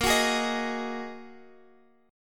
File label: A sharp 7th Suspended 4th Sharp 5th A sharp; strummed; chordshape; x,x,8,11,9,11